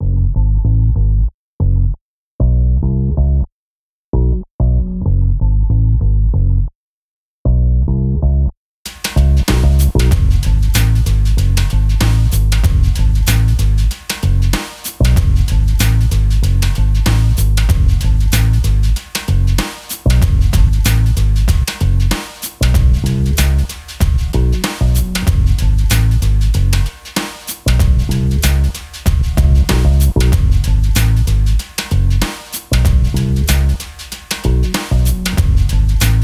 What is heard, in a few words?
bass